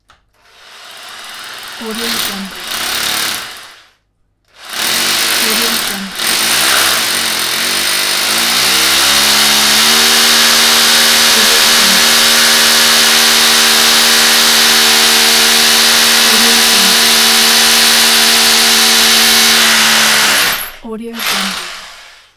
power drill

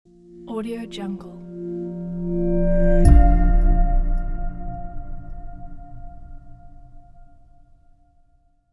logo reveal

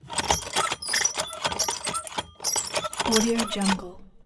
tobacco cutting machine antique crank

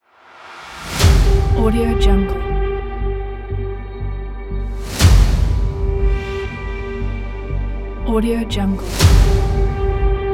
suspense thriller trailer kit

